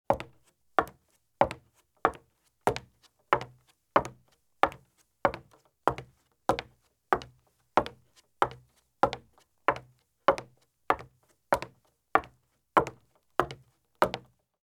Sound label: Women's Thin High Heel Shoes Walk at Fast Pace on Wood Porch or Footbridge; Sound Ideas; Footstep Sound Effects